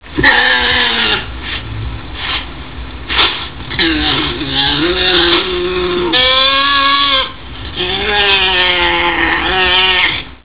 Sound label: tasmanian